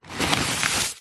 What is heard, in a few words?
office file folder wallet card paper sheet document slide in or out